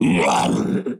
Bone Crusher Death

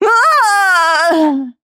Witch Death

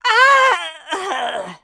Bazooka Death

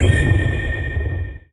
Death; Violet

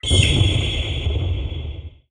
Violet What

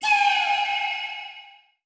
Chloropixie Death

